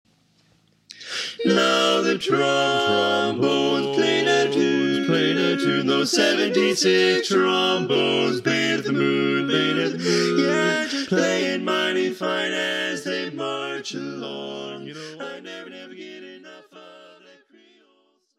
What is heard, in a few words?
Baritone